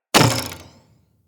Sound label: Dendrit Bow Shot Charged Twang, Bows; Weapons; Player